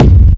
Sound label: raw kick drum sample f key